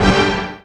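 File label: ORCHESTRA HIT; Q Up Arts; Dream Experience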